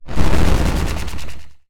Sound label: Oscillating Sci-fi Transition